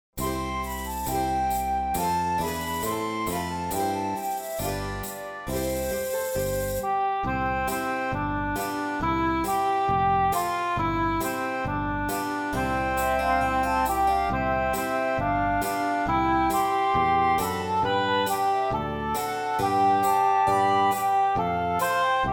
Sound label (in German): Lied instrumental; Die drei Federn – Weihnachtsmärchen Schelsen